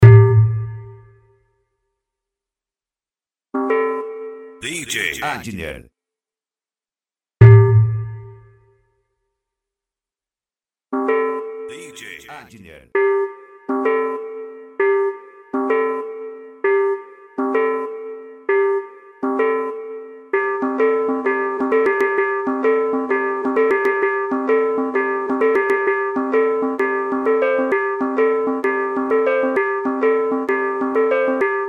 funk tumdumdum funk bass

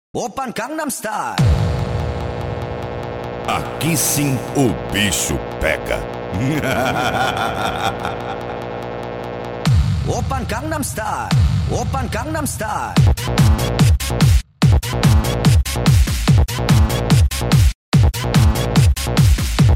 pancadao funk bass

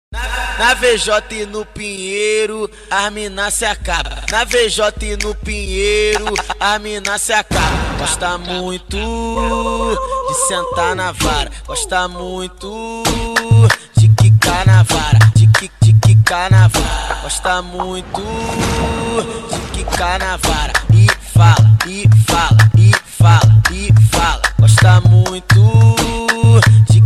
funk bass automotivo

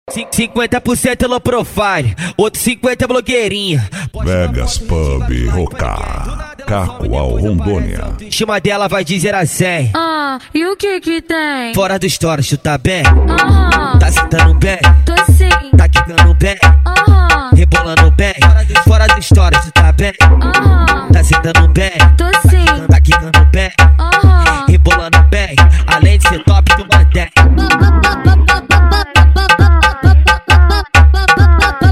funk mega bass

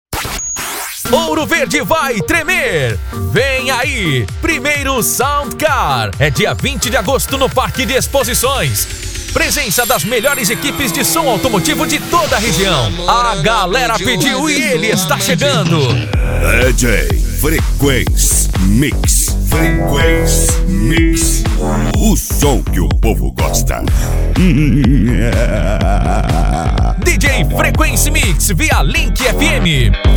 sound car

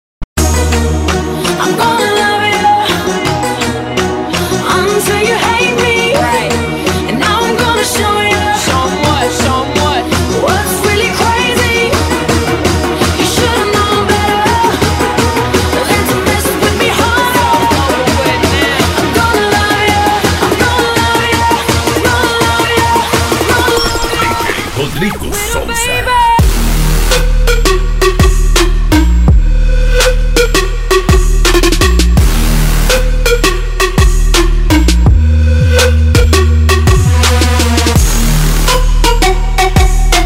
trap remixes

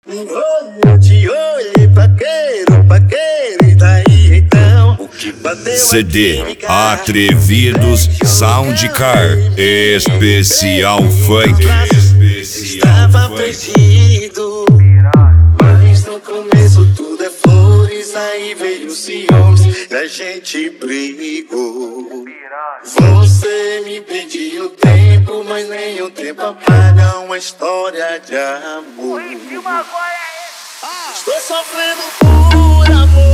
funk

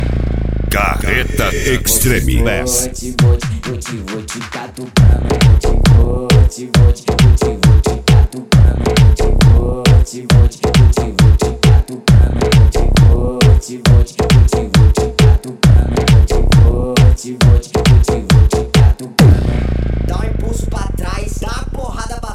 extreme bass; carreta

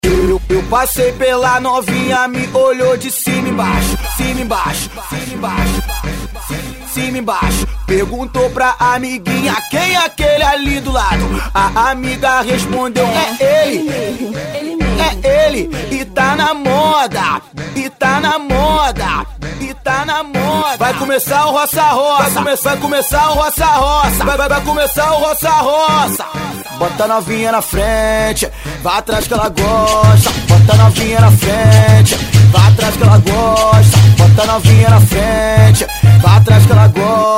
funk bass; impacto som

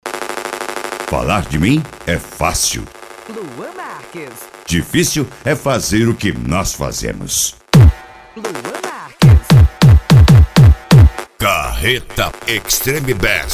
extreme bass; carreta

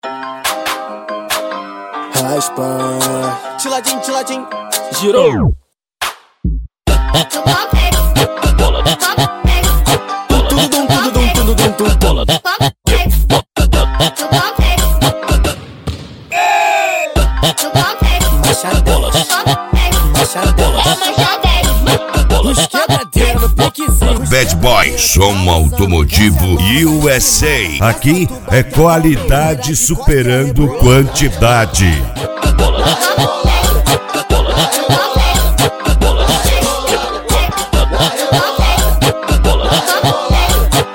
especial funk; cd bad boy som automotivo usa

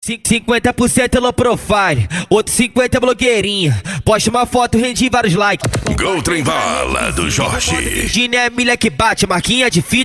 funk mega bass

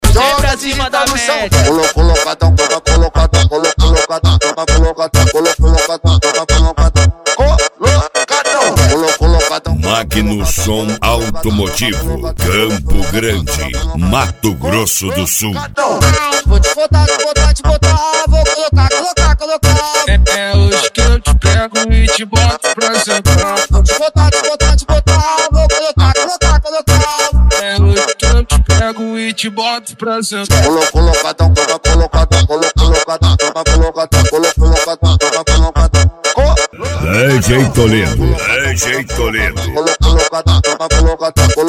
funk

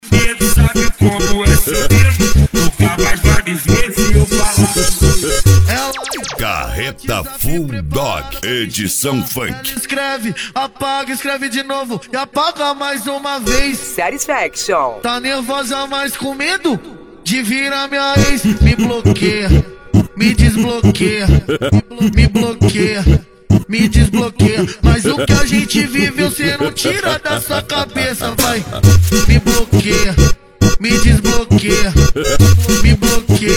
funk

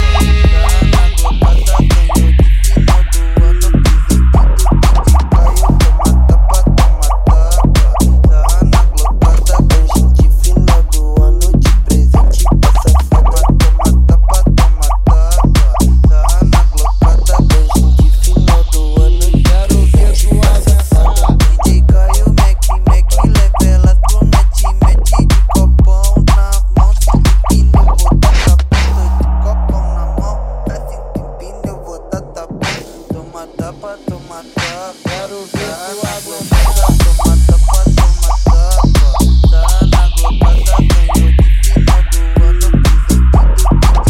funk bass

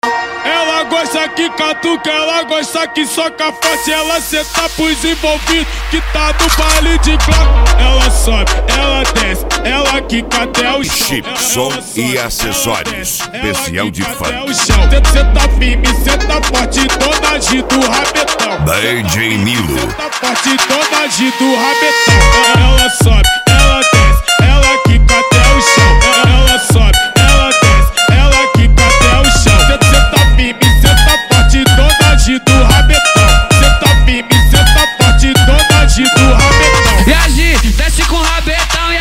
especial funk

